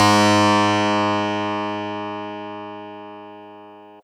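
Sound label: Fuzz Clav DX100 G#0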